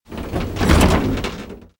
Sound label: Chest Open; the princess and the frog; Swamp